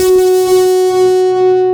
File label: STICK F4, Chapman Stick; Analog Odyssey